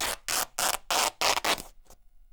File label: RIP, Canvas Bag Rips